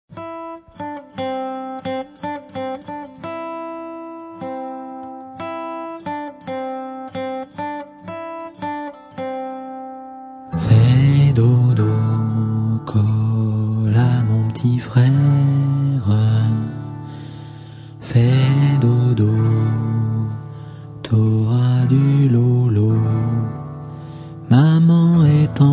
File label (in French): fais dodo vocals guitar, French